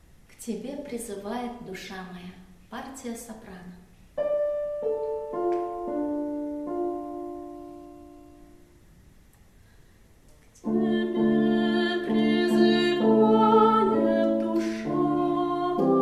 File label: Soprano; K Tebe prizyvaet